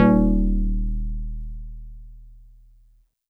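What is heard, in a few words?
StarPiano DX100 D#0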